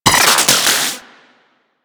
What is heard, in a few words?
Split Laser Beams Join, Weapons; Warframe; Corpus